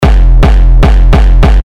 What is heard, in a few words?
Kick F; Rupture X; terra kicks; Revealed Terra Kicks